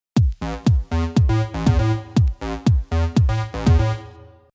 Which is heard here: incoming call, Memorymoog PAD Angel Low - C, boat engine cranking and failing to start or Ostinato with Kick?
Ostinato with Kick